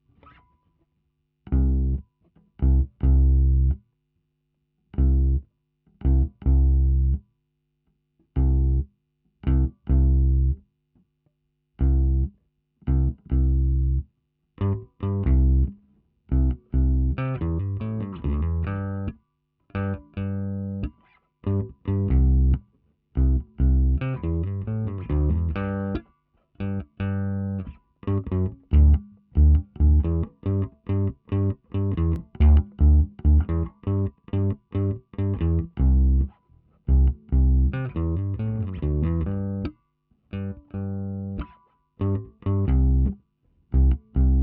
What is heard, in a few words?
bass; logic